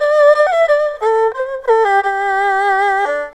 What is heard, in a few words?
ERHU 90 C; INIS - Opium